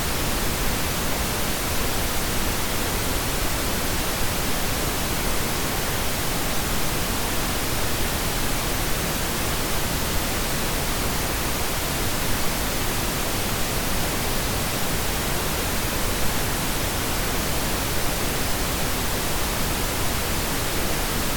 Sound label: Pink Noise with 10 minute and 1hr warning